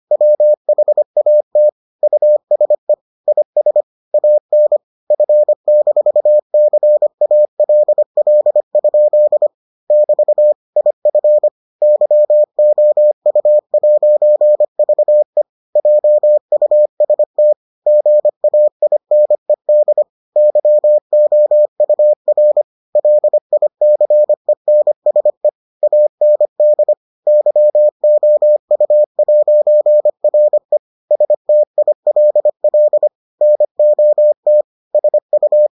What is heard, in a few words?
morse